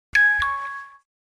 notification phone; alice in borderland

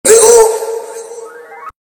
hi hat

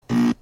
buzzer; thaums

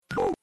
angry

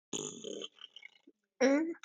fart

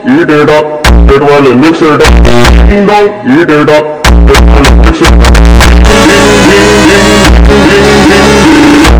ding dong phonk

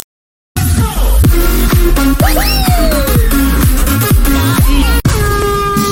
drop with horn

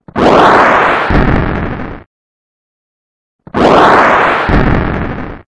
rocket launcher

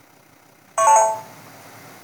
sony camera video end

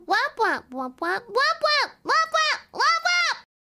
womp

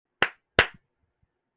double clap

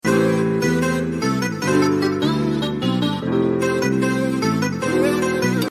music

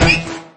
interceptor fire; jedi; lego star wars

